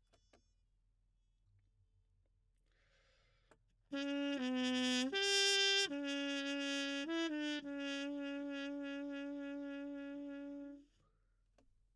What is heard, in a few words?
Trumpet